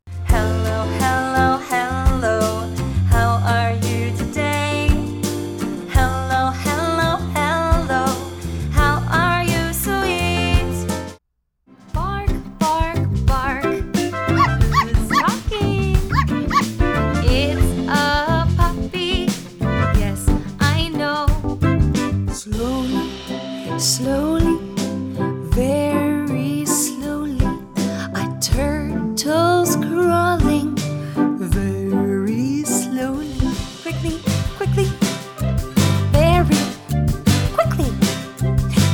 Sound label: childrens music